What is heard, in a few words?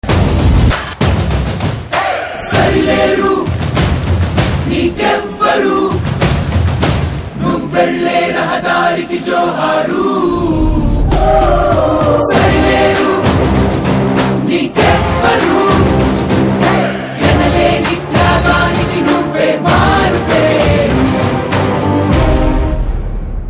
telugu movie ringtone